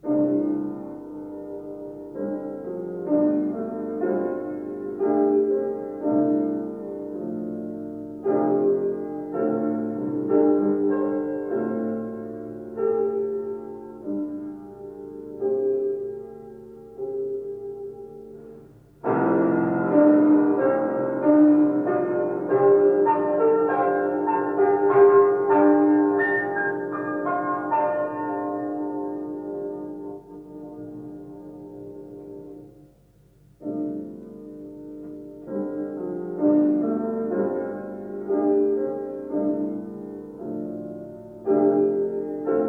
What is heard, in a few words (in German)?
klavierstucke, choral